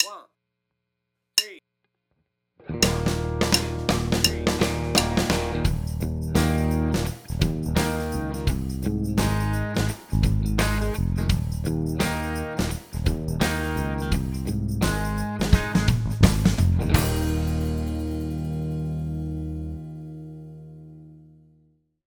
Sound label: funky solo, country; backing tracks; kinda funky short little country solo